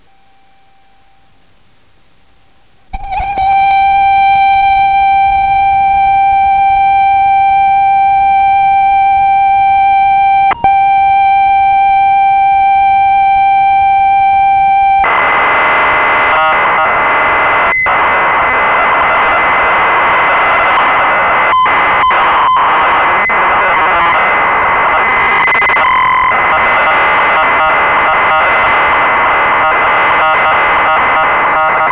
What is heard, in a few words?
Basic aircraft instrument simulator; Vernon Brown